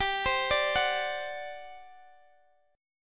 G Major 7th Suspended 4th, G, down, Guitar, DADGAD